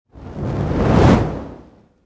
Fireball Catapult Pass By Flame, Fire ball swhoosh, flame whoosh through air